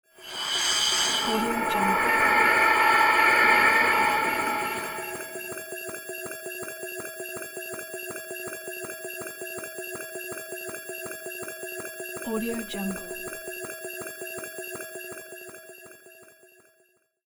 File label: cybernetic signal